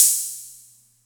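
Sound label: Atlantex Music Percussion Computer hi-hat open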